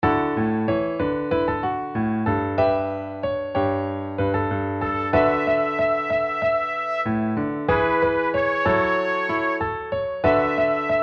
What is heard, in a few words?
piano melody